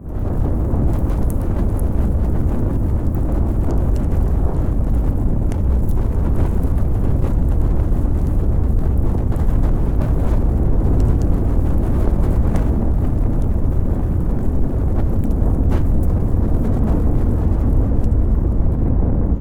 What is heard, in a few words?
FIRE GAS HUGE